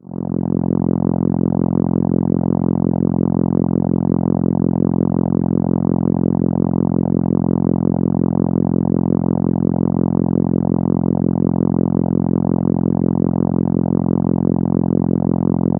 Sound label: F3, ARP Solina